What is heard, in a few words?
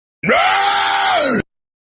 raaahhh sound effect